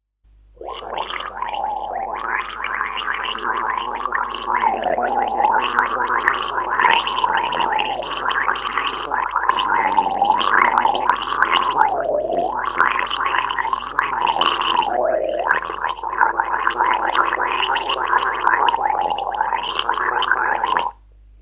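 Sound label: gargling sound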